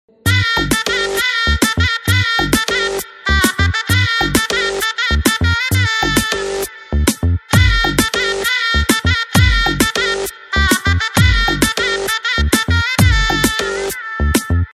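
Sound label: Instrumental Tone Telugu Ringtone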